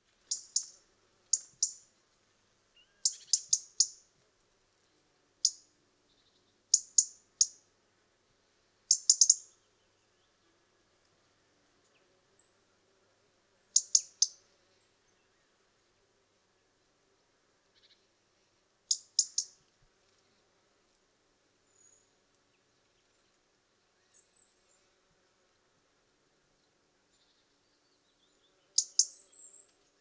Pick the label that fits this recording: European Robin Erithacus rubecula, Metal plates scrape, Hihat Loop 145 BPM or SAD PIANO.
European Robin Erithacus rubecula